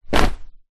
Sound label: foley wetsuit put down